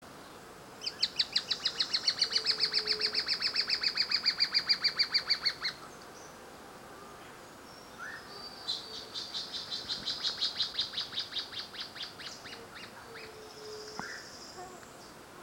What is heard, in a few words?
Trepador Oscuro, Dendrocolaptes platyrostris, Planalto Woodcreeper; cantos